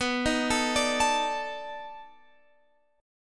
B 7th Sharp 9th, down, Guitar, Open F; B7#9; arpeggiated

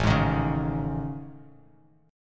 A Major Sharp 11th, Bass Standard; AM#11; strummed; JGuitar

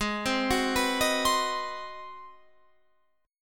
Ab 7th Sharp 9th down Guitar Open F; Ab7#9 arpeggiated; Ab7#9 Chord

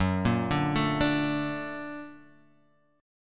A sharp Augmented Major 7th

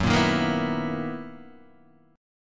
B Major 11th Gb

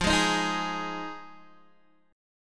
F Minor 7th, F, Guitar, Open D; x,8,6,9,6,6; down; chord shape

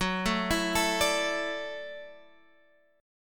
E 7th Suspended 2nd Suspended 4th, Gb, chord

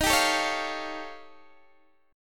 Eb 9th Flat 5th, Guitar, Open D

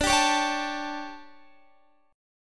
D Major 7th Suspended 4th, Guitar; DM7sus4; strummed; JGuitar

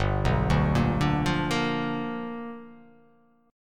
G 7th Sharp 9th G chord

